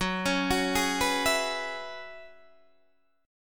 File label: F sharp 9th Suspended 4th F sharp Guitar Open D